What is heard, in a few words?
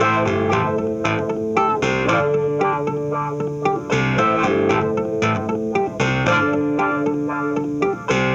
Cruisin' Disco Clavinet, 70s Analog Funk; Sonic Foundry (Sony Creative Software); What it Is!